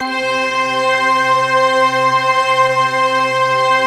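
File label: C 5, BELL STRINGS; Best Service; Advanced Media Trax Modern Composer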